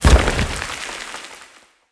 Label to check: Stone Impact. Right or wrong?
Right